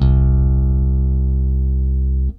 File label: BASS, ROCK POP; MAGIX